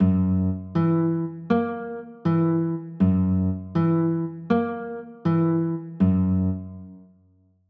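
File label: G flat 6 chord, guitar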